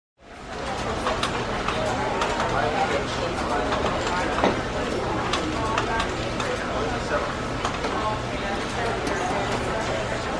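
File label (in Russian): общие звуки офиса. голоса звонки работа на компьютере.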